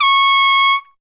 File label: TRUMPET FX; Zero-G; Phantom Horns